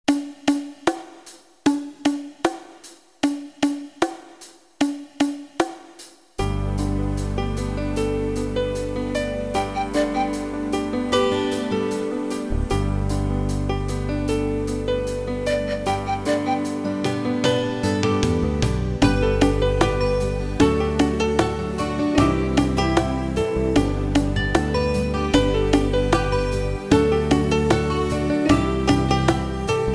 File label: Bongos